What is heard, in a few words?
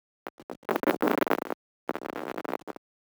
Line Break